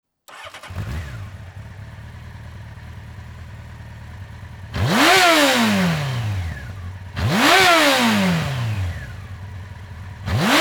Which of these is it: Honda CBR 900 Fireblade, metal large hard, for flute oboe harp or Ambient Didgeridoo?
Honda CBR 900 Fireblade